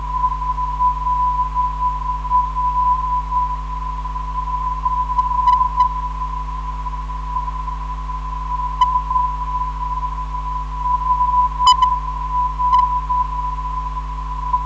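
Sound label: non-directional beacon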